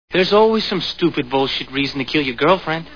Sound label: scream